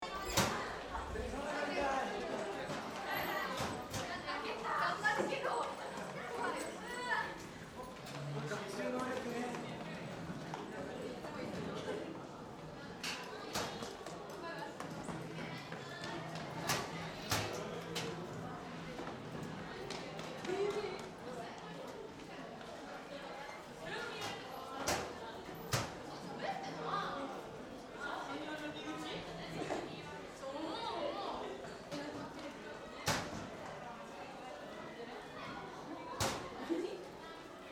school shoes locker

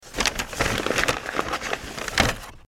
paper bag

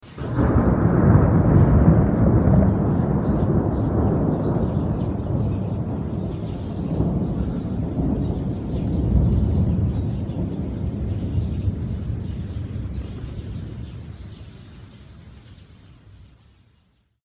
thunder

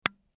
switch button

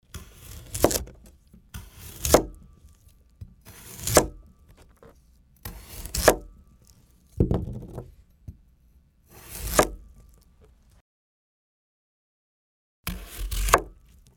radish cutting

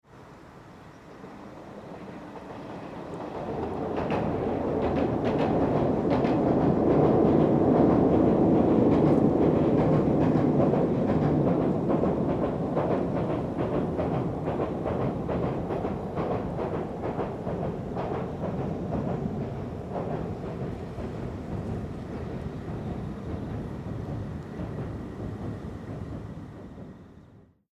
Chikugo river train

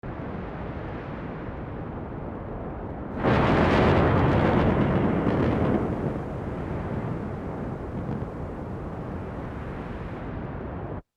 japan huge rock fall

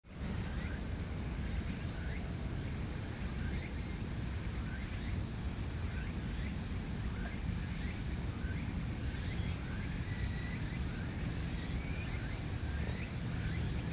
forest

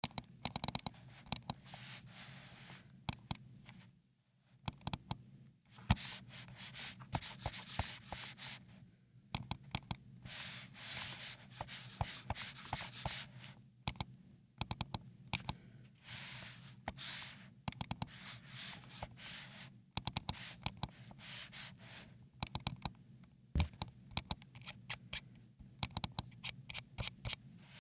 PC mouse mac

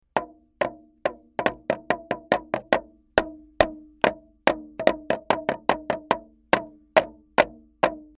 toy drum

